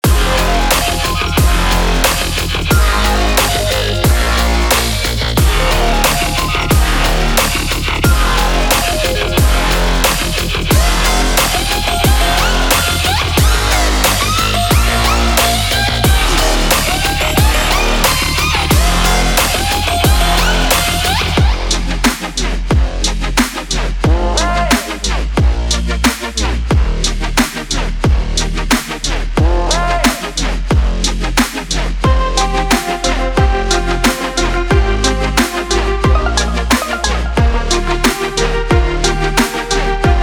Drum Pad Machine Groove Box

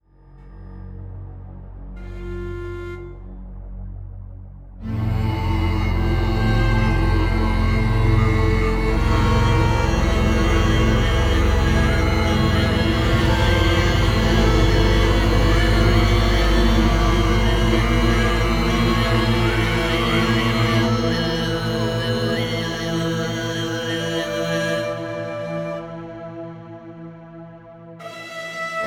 Tibetan Singing Bells Monks

